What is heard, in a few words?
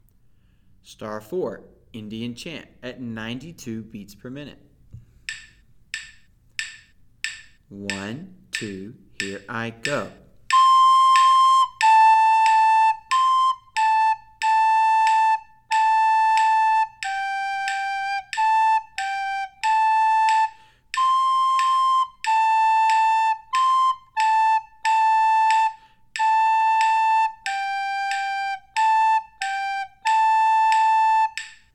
92 bpm; Recorder; Indian Chant; Be A Recorder Star Songs